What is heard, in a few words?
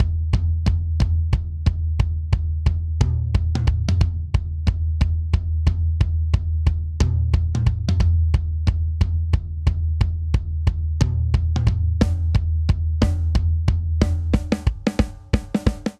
Swing Six RnB Buildup 6/8 90 bpm; Ableton; Factory Packs; Drum Booth